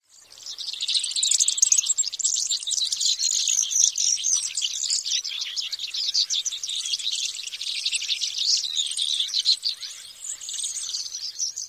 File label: winter flock; AZ